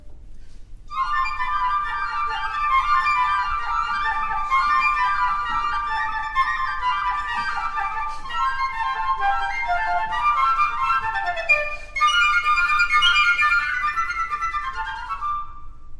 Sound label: Piccolo